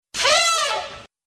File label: Elephant